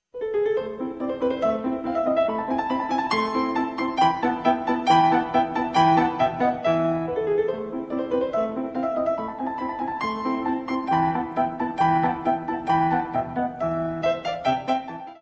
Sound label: piano